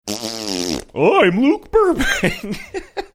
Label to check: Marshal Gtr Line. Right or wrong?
Wrong